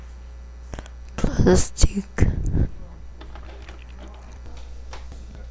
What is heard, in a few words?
plastic